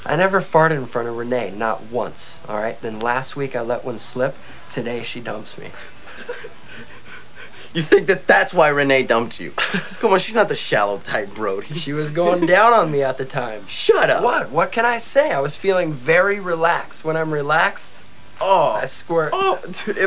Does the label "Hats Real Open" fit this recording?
No